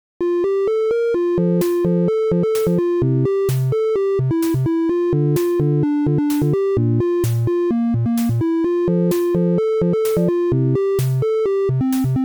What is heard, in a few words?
chip bgm; Fumofumotris